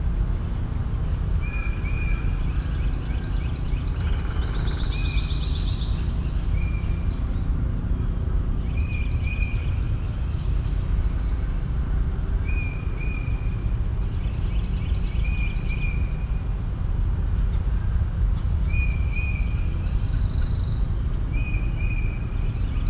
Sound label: Suburban park day birds machinery insects; urban and suburban; boom library